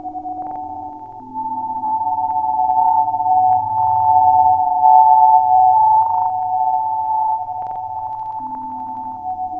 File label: Spectral Sonar; Sonic Foundry (Sony Creative Software); Loop Noir; Session 12 - Otherworld Journey